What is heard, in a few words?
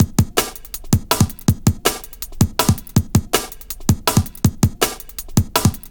162 Roll, breaks; m8 breaks